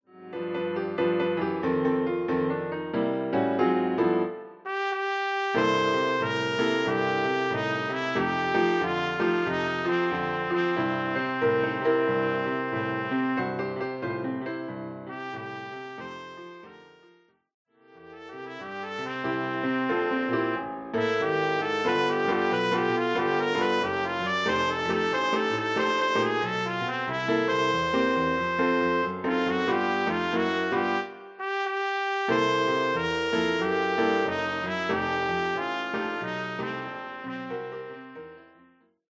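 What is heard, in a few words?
trumpet; solo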